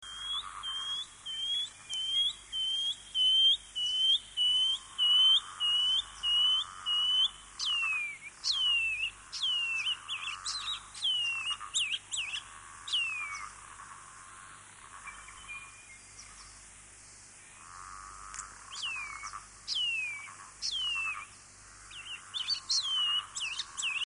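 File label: Shining Cuckoo, Bird Calls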